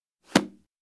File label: Book Impact; LaundryCinematic